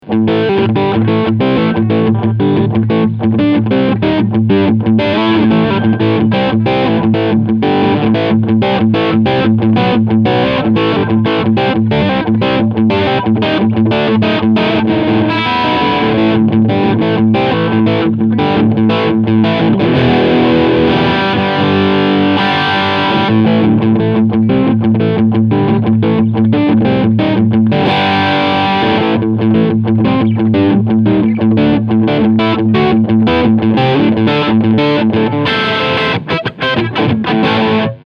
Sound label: Crunch